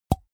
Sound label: snap cheek single; koerpergeraeusche; menschen; soundpool